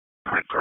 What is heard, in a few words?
FD ALERT, p25; wake